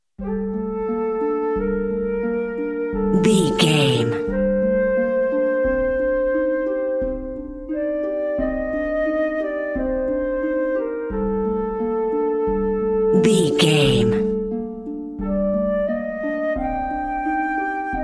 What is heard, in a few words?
music calm green lake serenade